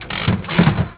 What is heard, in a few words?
handle, slot machine sounds